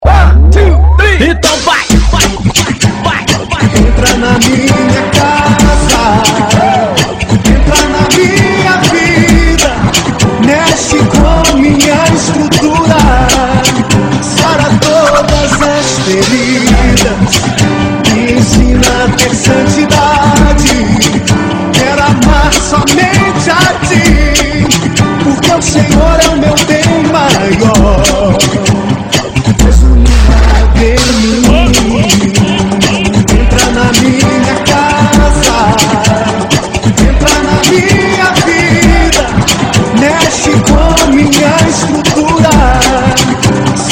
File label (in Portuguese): funk